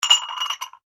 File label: household glass ramekins x2 clink; Clinks; Objects; McKinney Sound